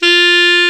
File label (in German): Klarinett; Alpine Volksmusik